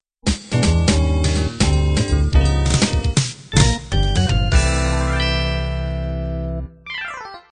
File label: b3 jazz end